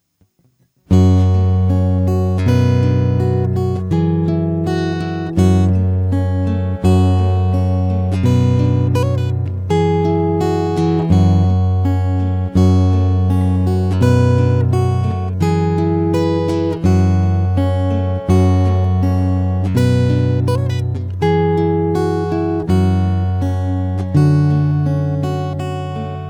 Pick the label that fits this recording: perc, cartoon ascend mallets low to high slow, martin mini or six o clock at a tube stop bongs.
martin mini